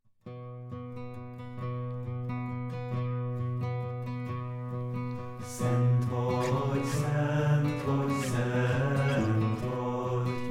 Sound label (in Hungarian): Szent vagy gitar